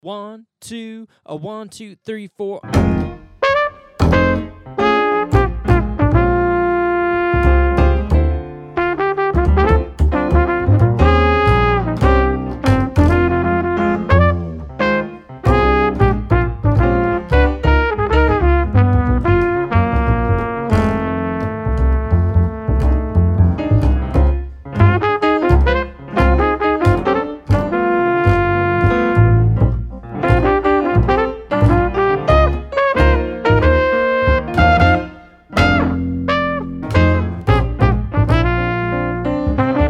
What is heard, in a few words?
No Drums, Play Alongs